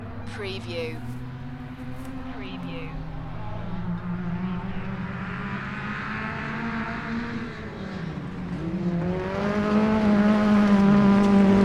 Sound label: Dirt track car racing UK ambience from a spectators position; beanstalk audio